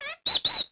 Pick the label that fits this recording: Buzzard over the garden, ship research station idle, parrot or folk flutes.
parrot